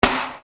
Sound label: Snare Dr